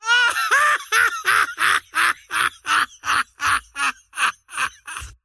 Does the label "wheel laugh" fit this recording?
Yes